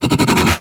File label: Kubrow Tame Idle Alert Scratch Irritated B; Warframe